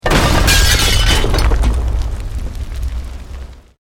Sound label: Explosion Medium Brick Wood Glass